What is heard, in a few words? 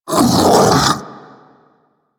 Infested Splitter Split Vocal Attack Medium; Warframe; Grey Strain